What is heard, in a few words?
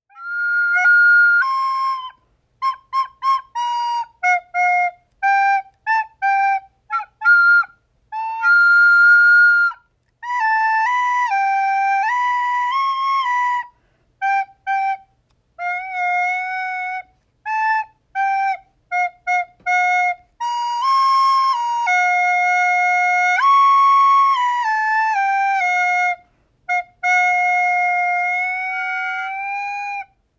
Recorder